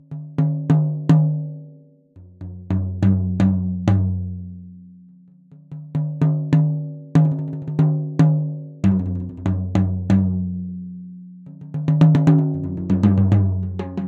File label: md 421 kompakt jazz drums stereo; mikrofonu test